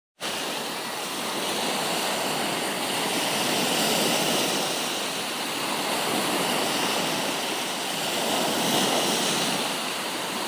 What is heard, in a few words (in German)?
Meeresrauschen